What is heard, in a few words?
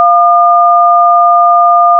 DTMF 1 Ideal